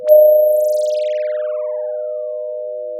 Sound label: Sound of a phone ringing